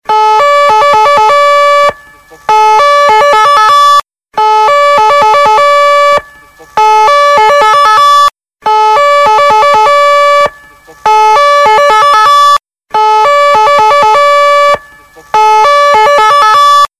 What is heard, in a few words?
Police Austria